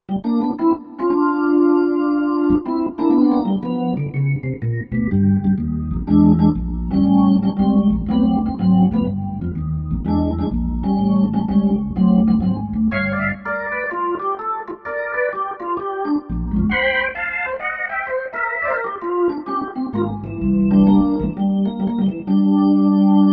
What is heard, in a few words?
Blues Perc; '60s & '70s; Roland SR-JV80-08